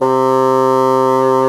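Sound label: HARD BASSOON C4; Northstar - New Gold VOL-2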